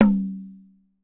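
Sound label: INDIAN MIRD, ETHNIC SET; Voice Crystal - Steve Reid Definitive Percussion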